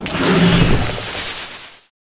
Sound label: Rat die